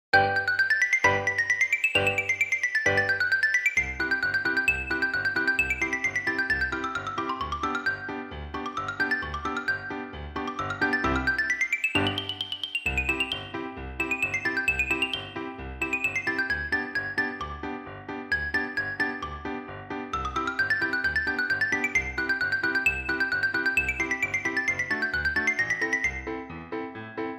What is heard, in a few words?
Galop xylo